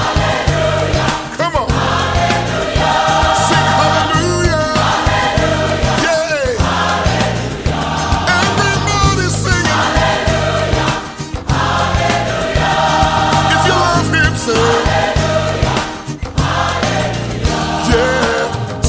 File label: RingTone